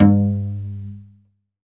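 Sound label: SOLO CELLO, Synclavier Strings; ILIO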